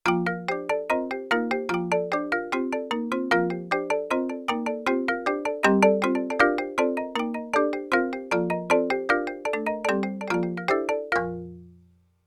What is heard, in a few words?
Ethnic, Marimba, Yamaha TX16W